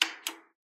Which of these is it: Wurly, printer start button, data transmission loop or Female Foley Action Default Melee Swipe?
printer start button